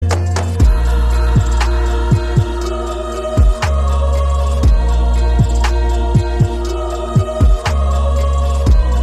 lofi; avatar

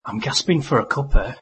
gasping

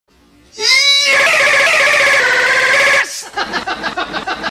screaming yes; fesh pince of blair

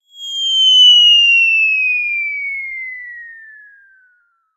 cartoon falling sound effect

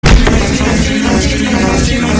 toilet

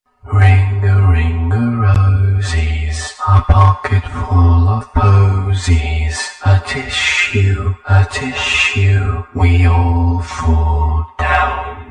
singing; scp-049

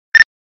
walkie talkie; nextel

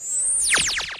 laser

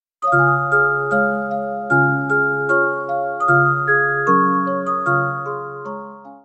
jingle bells music box

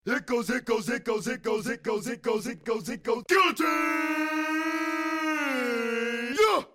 guillotine

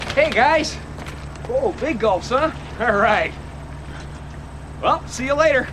gulps

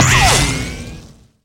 modern spring

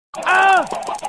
ugh

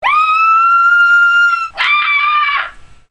victreebel cry

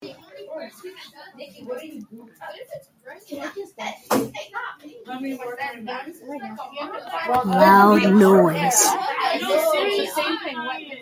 noiseeeeeeee

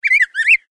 fx bird chirps small

